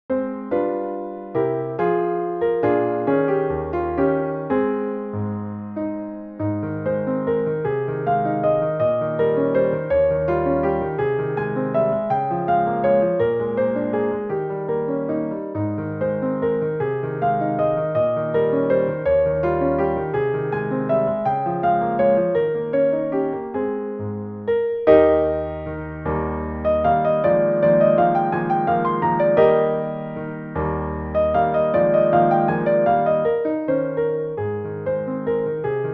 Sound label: Waltz As dur